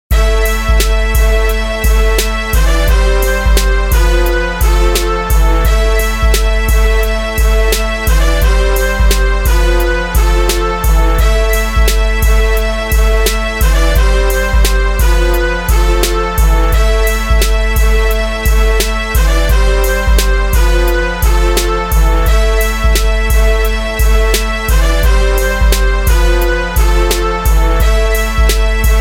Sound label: Hip Hop